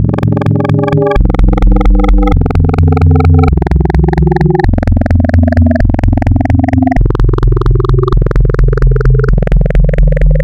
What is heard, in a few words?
Spectral, wavetables; Architecture 32